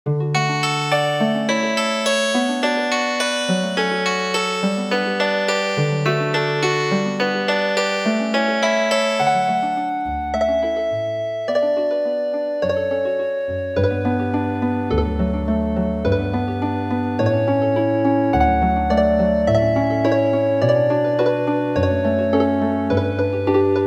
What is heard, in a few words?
Canon in D, Healin Harp